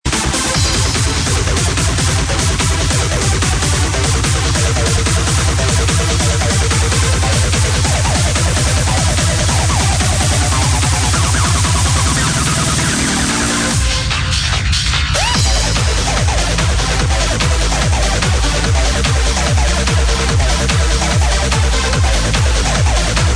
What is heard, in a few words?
Pump Beat Kick Drum remix